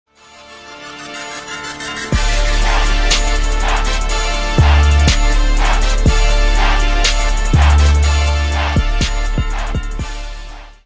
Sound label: instrumental